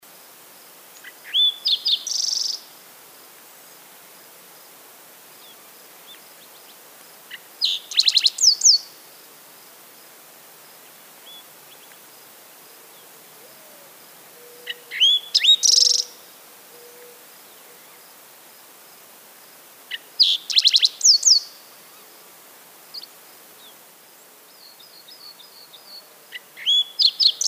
Green tailed towhee; پرنده آدم گریز دم سبز

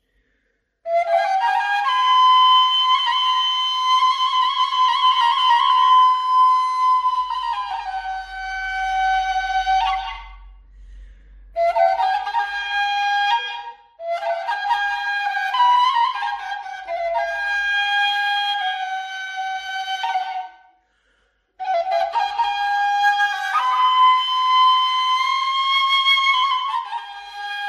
Kaval; کاوال

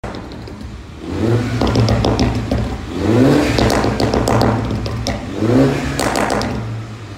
Cooper exhaust

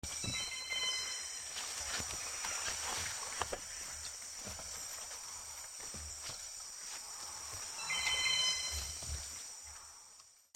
Spangled drongo; پرنده بوجانگای پولکی

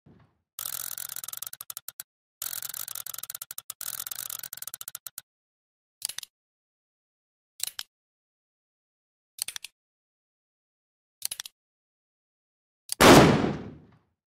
Russian Roulette

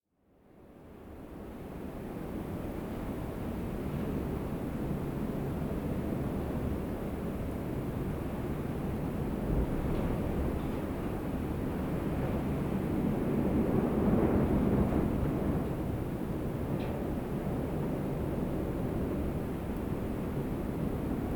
wind outside sound ambient

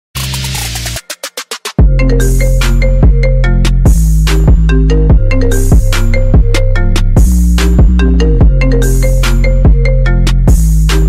Apple iPhone Ringtone

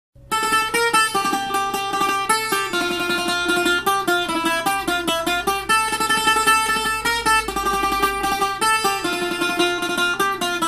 Laúd

